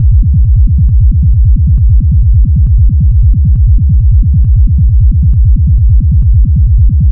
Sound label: Bass Loop C 135, Techno; Ghosthack; AC2024 - Day 1 - Techno Sounds 2025